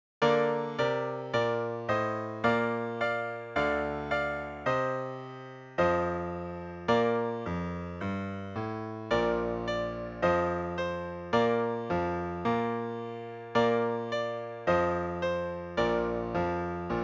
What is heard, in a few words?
Solo Three; for all instruments except Eb Brass, Eb Clarinet &, F Horn